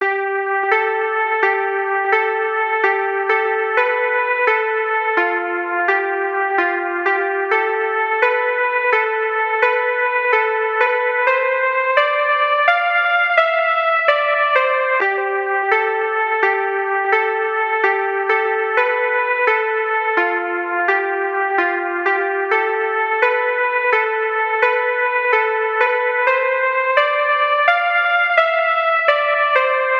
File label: melody